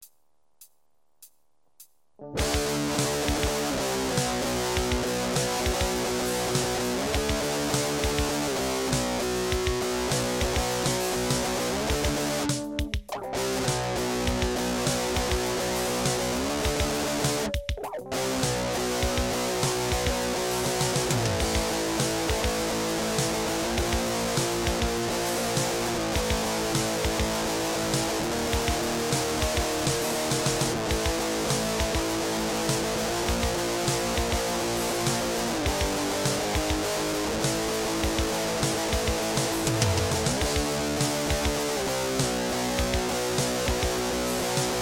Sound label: 70s rock song